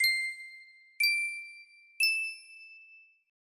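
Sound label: music box melody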